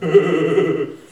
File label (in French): rire